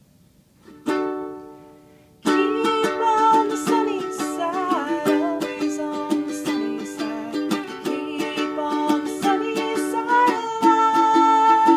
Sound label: lone uke